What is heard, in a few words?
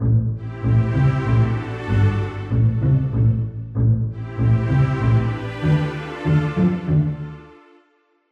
music strings; Bejeweled 3